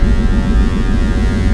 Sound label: targetinglaser paint, weapons; Tribes2